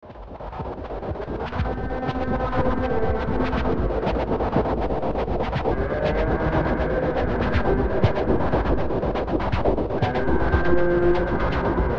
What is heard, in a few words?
Bed Pulse Wash Deep Horn Moan; Bed Sound Effects